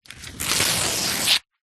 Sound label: household book telephone directory page thin rip out